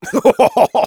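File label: laugh short, German; Kurzes lachen 3; Spy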